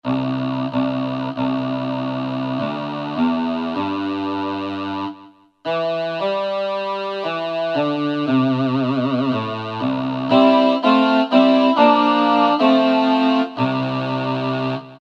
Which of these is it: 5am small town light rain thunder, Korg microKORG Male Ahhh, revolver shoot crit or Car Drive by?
Korg microKORG Male Ahhh